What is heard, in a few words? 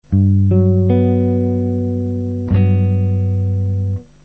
g maj7 shell